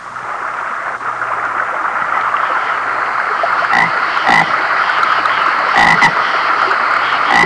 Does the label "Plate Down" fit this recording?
No